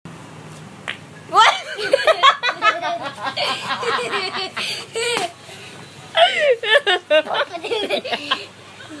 Nhac nen Tieng nhay roi danh ram va cuoi; TikTok

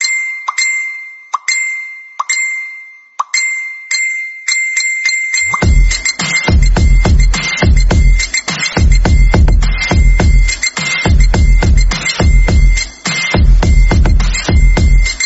Nhac chuong remix; Messenger

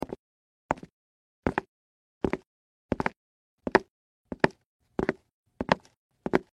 Tieng buoc chan di

